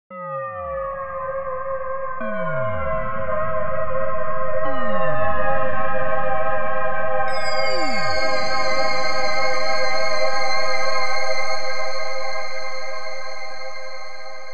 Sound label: with external reverb; ZynAddSubFX early history; historical sounds